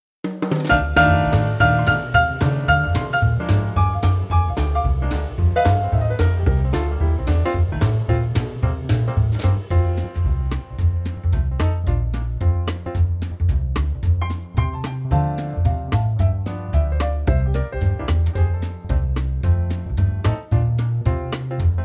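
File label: backing track; key - F - vocal range - C to D; Old country hit in a TRIO arrangement, with loads of space for extra musicians to solo over!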